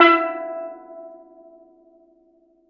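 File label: STEEL DRUM; E-MU Producer Series Vol. 7 – Old World Instruments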